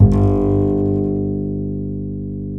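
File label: double bass G1, Jazzistic; Univers Sons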